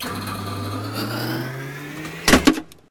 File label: food